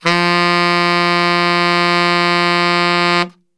F 1 SAX STR, Brass; Best Service; Brass Super Section; SAX STRGHT

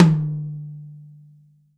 TOM, Real Drum Kit

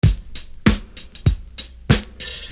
Beat, Hip-Hop Street Beats

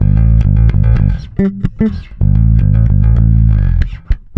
NASTY A, bass; Spectrasonics; Bass Legends

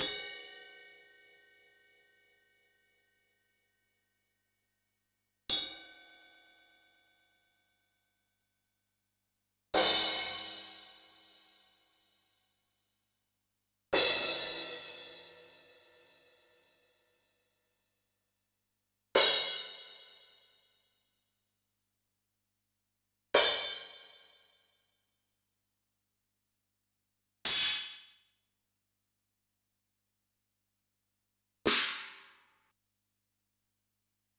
Yamaha DD-65 CYMB

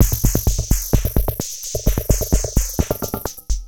Beat, Industrial

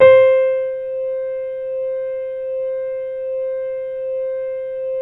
VOX PIAN; MORPHEUS

